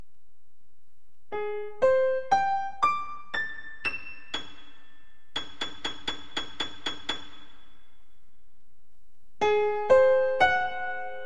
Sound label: aug 6 chords